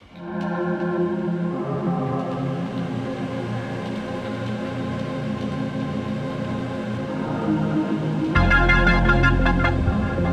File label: looped song; flutter; audio soloud